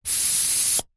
sport plastic hand pump single long air release pump nozzle end; zapsplat